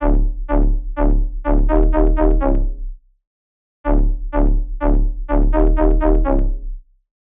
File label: 125, D, Bass Loop